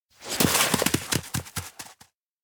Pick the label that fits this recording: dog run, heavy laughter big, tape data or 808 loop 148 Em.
dog run